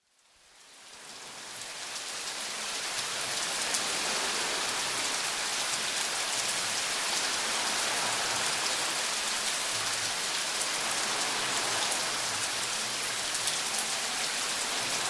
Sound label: Rain